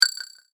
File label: multimedia rollover, Multimedia Rollover Tone; Tones; Sound Design; McKinney Sound